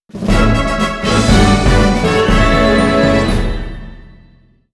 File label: JINGLE - 1-Up; sonic before the sequel